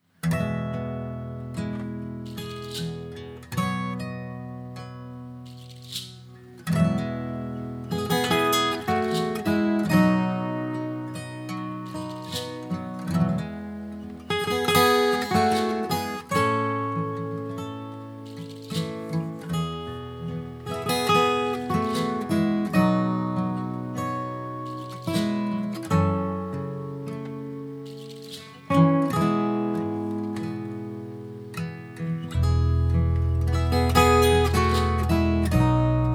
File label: Safari Sun Lullaby Instrumental